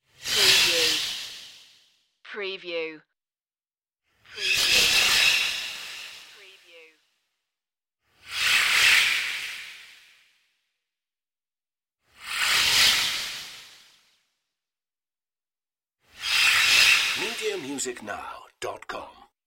magic creature flybys

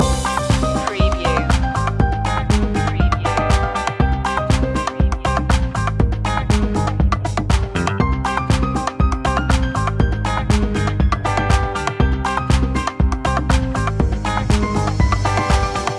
latin dancing loop; media music now

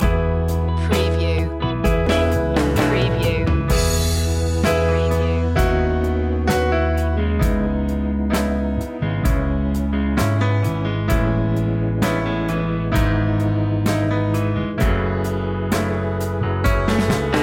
southern church loop

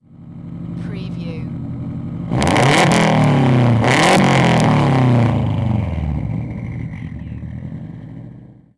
SUBARU STI EXHAUST DOUBLE REV

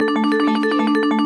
Casino Slot Machine Arpeggio

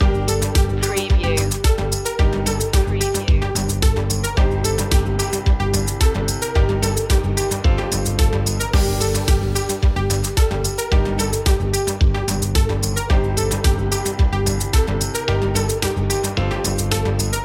corporate machine loop; media music now

